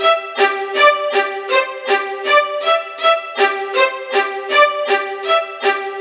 Spizz Viola; струнные инструменты

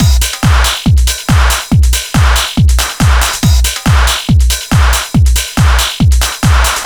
Technik Beat

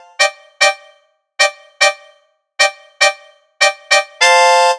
Mut rumpet, духовые

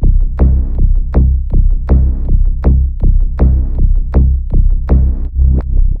Bas Dis, downbeat

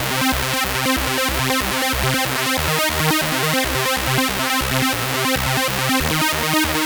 Tech Lead A, техно-транс

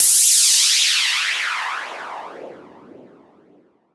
Fly Away Fx; Звуковой эффект (RnB Soul); 110 BPM; SFX; звуковые эффекты; ритм-н-блюз

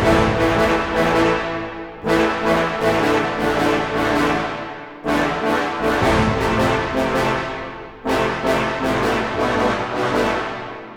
Brass Ens stacc; Сэмплы для фильмов; Brass Ensemble B (стаккато); film music; духовые инструменты; Movie Score; 80BPM

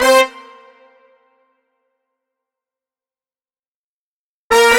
Brass Fill; духовые

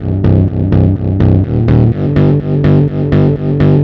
Gruff, минимал

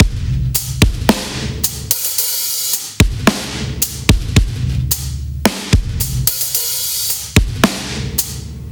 Tenor C

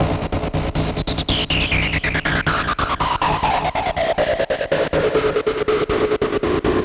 Gattling; Звуковой эффект Hardstyle; FX Loop; 140BPM; SFX; звуковые эффекты

